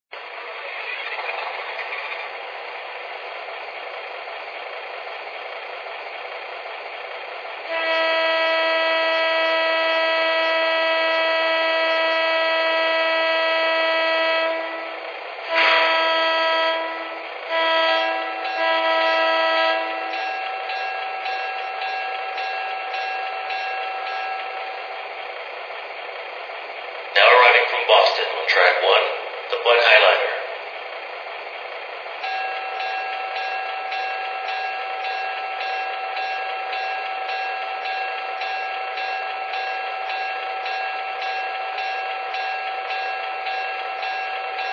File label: BUDD, trains